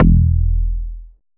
CLICK, Synth Bass; BA1 Synth-Basses; Sound & Vision - Gigapack I CD 2

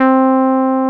TB-303

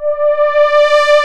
LFO REZ; Soundengine - CSR-000 The Art of Transwaves

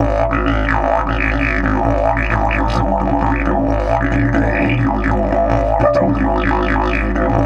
130, Didgeridoo; Big Fish Audio - Didgeridoo and Other Primitive Instruments; 130BPM

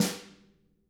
HIT, Drums, SNR; CAMCO

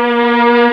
MELLOTRON; East West - Global Sounds

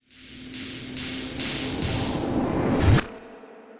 Reverse Fx; musicradar

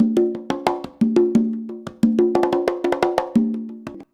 CONGA 119, Modern Percussion Loops

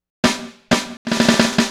Snare Fill

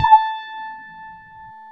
WOW GT1-A4, WOW GUITAR; AKAI S-Series CD-ROM Sound Library VOL-7

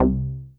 POKE BASS; BASSES

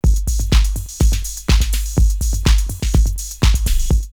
Mouth Ball 124, drumloops Rave; X-Static Goldmine; 90 s Sample CDs

